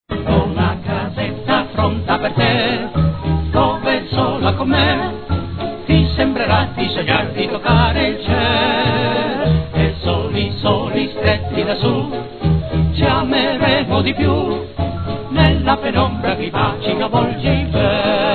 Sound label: ORCHESTRA JAZZ SINFONICO & TRIO VOCALE; 1936; 78 giri; CANNOBBIANA; COLUMBIA; Incisa nel Febbraio del 1936